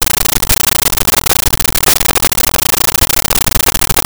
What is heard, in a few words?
Rhythm